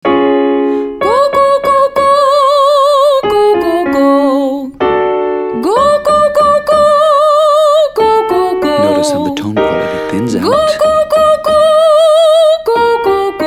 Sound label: Female demonstration